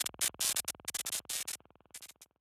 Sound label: Electricity Status Effect One Shot